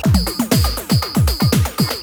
FLAW LOOP, breakbeats

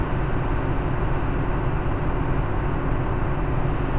Noise; sparse soundscape

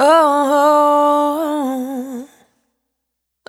Urban, Single Voice Melodic Loops, Vocal Samples

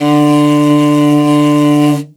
R, morin khuur, World Instruments; Best Service ProSamples vol.52 - World Instruments 2; AKAI

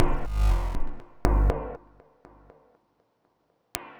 Mod Loop, Modular Percussion; Sonic Foundry (Sony Creative Software); Synchro Funk

